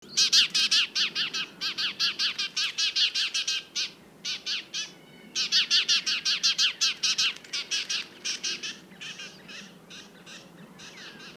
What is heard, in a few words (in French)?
moineau appel